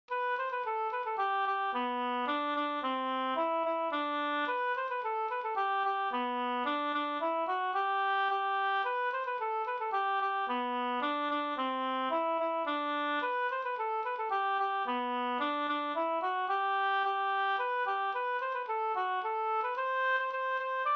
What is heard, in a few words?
polka; Vasshultapolka Hl